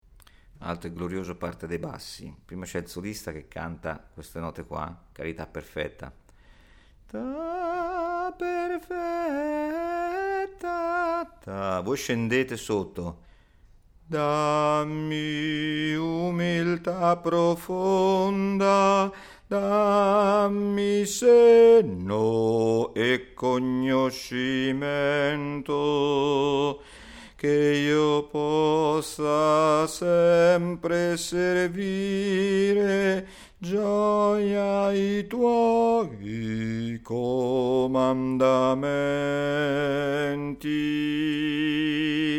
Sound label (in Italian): bassi alto